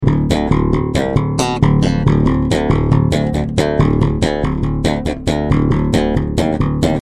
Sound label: slap, basse; gratte